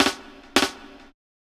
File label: snare fill 80